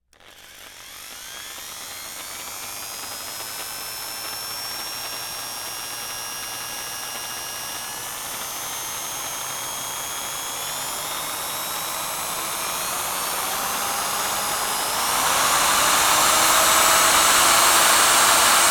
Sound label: industrial drill slow increase in power then full power burst